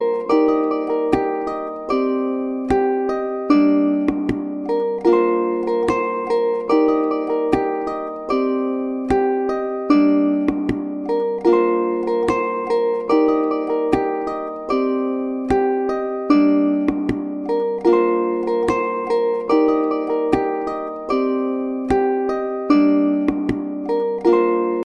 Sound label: Harp